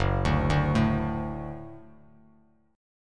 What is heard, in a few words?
Gb Minor Sharp 5th, Bass Standard; arpeggiated; 2,5,0,2; JGuitar